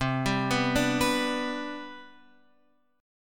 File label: C Major 7th Suspended 2nd C, chord; CM7sus2 Chord {8 10 9 7 X 7} arpeggiated